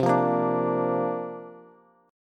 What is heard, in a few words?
C 7th Suspended 2nd Suspended 4th, Bass; strummed; C7sus2sus4